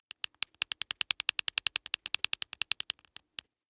Cartoon Teeth Teeth Chattering

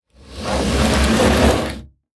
Foley Movement Wood Hollow Wood Slide On Cement

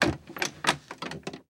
Chair Creak Sit Down; household

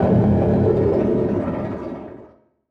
Title Transition Burst; Adobe; Production Element

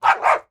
Animal Dog Bark; animals; AdobeCC

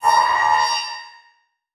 Transition Feedback Electronic

